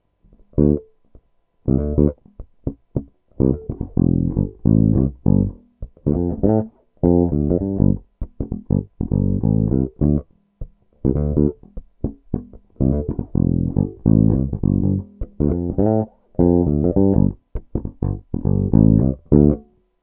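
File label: Bridge Fingerstyle Tone DOWN, peavey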